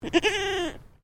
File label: Animal Goat Boer Kid Baa; Animals from Edgar's Mission farm in Victoria; Goat Sound Effects; Goats (Sound Effects:Animals); Foundation1